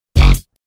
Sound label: bwomp sound effect